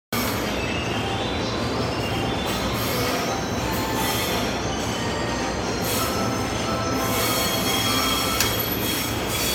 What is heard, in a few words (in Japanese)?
京浜東北線根岸南, train sounds